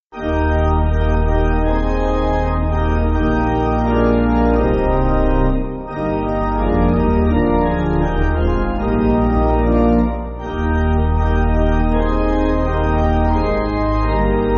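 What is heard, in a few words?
Just As I Am, pipe organ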